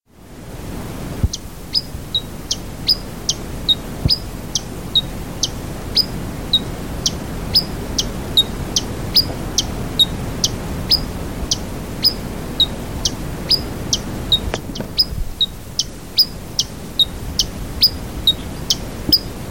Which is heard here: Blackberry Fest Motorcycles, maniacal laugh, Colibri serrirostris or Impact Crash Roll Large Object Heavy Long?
Colibri serrirostris